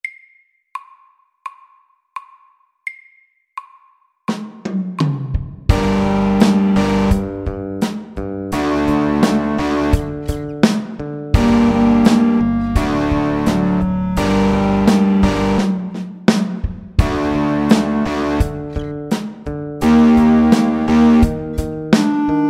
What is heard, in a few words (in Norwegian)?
Elektrisk rock SLOW 85